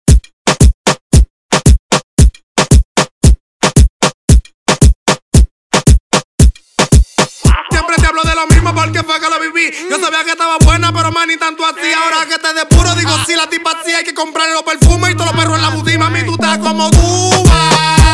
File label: remix bpm 114